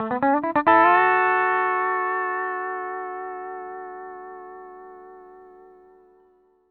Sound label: PD LOOPS 140, Whole Lotta Country